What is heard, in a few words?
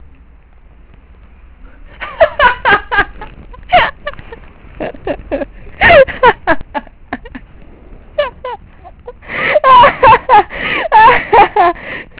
laugh